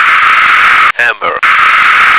hammer

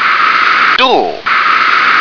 door

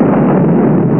bomb

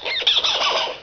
monkey

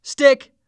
STICK

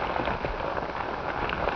CRACLOOP; QUARTZ

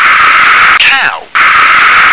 cow

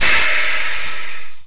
steam

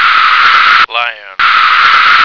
lion

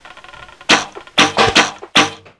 BOW SHOT

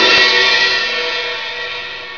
Cymbal

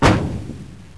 FIRE

